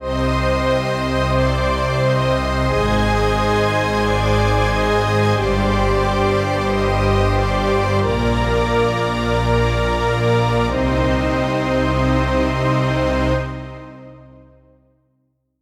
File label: Analog Strings; straightliner